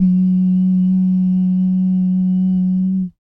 bass dums; Spectrasonics Vocal Planet; Jazz FX

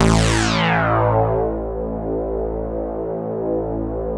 LEADS; Masterbits; Vintage Voltage

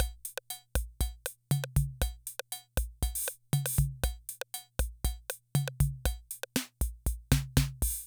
Loop, rhythm 55, classic machines

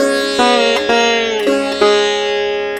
SITAR LINE, SITAR MELODY

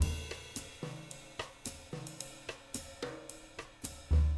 110 LATIN, Living Drums; Sampleheads; Peter Erskine; JZ 110 LATIN

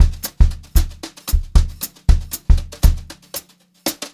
Brushed Drum Loops, JAZZY

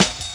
jazz ride cut, drum loop, 165.5; Jungle Warfare 1&2

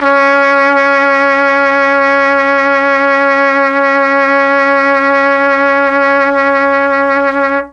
C#2 trumpet, Mellotron; CHMB TRUMPET; Mike Pinder - Mellotron Archive CD

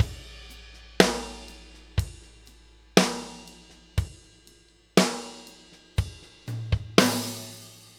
New York Loop, Drum Tools, Laidback

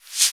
Shaker; Equipped Music; Loungin House

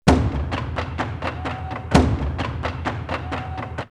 Bujumbura 128, drumloops World Ethnic; X-Static Goldmine

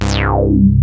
ARP 2600 G2, synthesizer; Hollywood Edge - Giorgio Moroder Rare Synthesizer Collection

